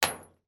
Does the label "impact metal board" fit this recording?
Yes